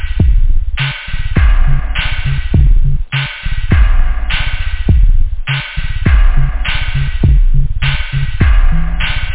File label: beat, house loop; da capo vol1